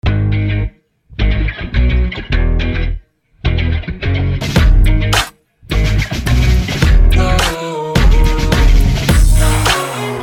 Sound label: Sports Theme, Hip Hop; Sports Theme Sync Music Licensing Hip Hop Trailers